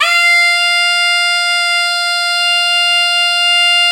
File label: MIZMAR F4